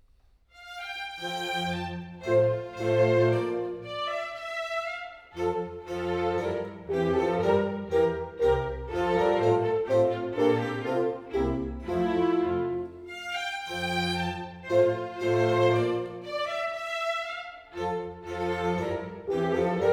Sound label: Menuetto; Gallimatthias musicum KV 32; Mozart; Serenades-Divertimenti-Dances